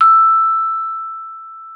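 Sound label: vibraphone E6; Xsample VOL-4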